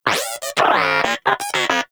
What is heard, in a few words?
Moa Inquisitive Vocal Enemy Killed; Pets; Warframe